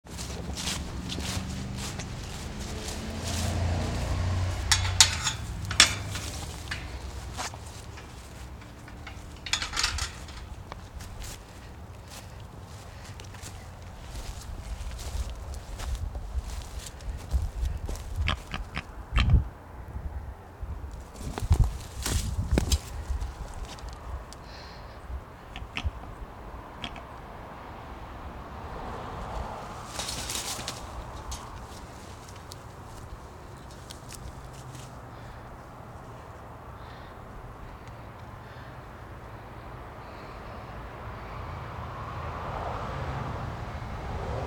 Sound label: Eagle Fledgling